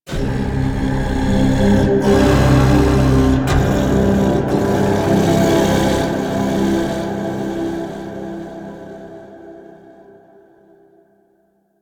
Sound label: Reveal Vocal; Warframe; Man In The Wall